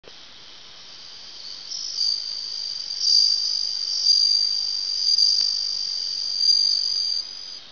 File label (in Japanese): suzumushi